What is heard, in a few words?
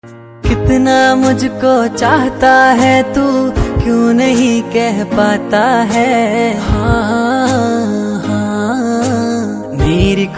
papa father song ringtone sad ringtone; bapu ringtone father shaab ringtone